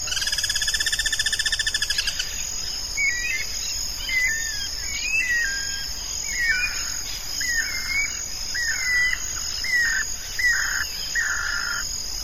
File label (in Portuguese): anu-branco, aves